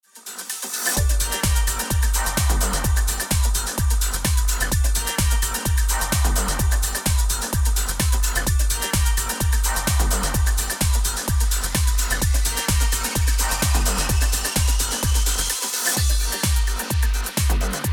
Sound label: Sample House Before